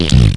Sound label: splat; Frog Xing 1.1